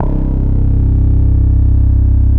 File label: bass, Drum & Bass, 145 BPM; Ueberschall; Drum & Bass Resonance